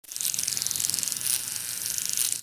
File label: Insect little monster flying wings